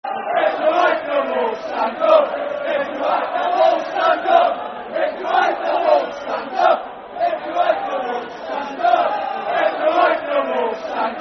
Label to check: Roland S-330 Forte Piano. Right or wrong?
Wrong